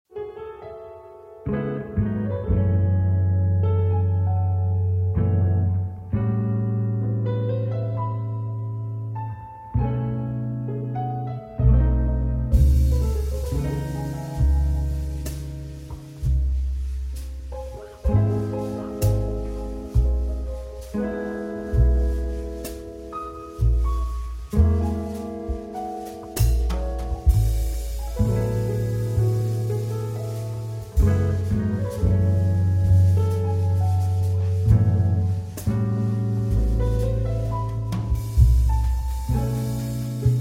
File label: Blues & Jazz